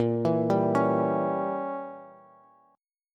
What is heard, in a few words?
Bb Diminished 7th Bb Bass; arpeggiated; JGuitar